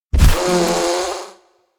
elec bugs burst out; anemoi

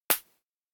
slap cheek; anemoi

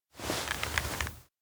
map tear wipe; anemoi パッケージ版

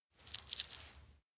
feed konpeito; awayuki; anemoi

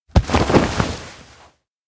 stumble; anemoi

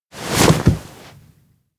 climb out hole floor; ken; anemoi

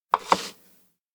plate place table; anemoi

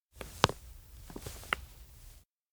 knuckle crack; anemoi

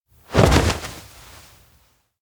dogeza crawl; chiharu; anemoi

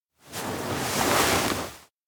stand wobble; koyomi; anemoi パッケージ版

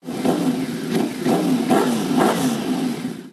Vroom Vroom Bike; anemoi

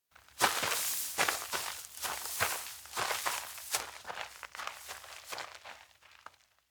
steps outdoor forest walk; ken; anemoi

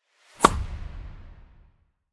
ball hit; anemoi

